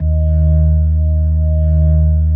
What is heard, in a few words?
EB1S, B3